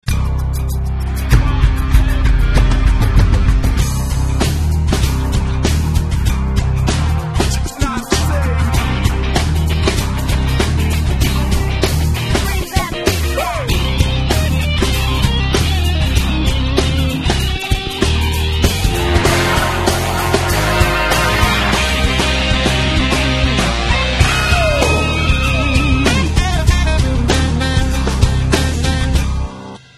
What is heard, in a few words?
music; megafon; happy hours